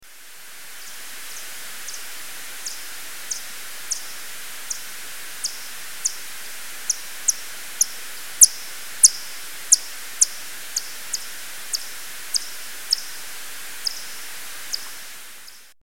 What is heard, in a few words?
little brown bat